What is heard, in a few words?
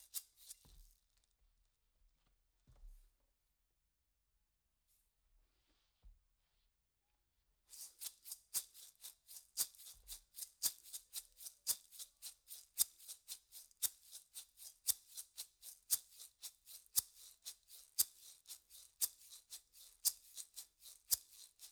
PERC DYN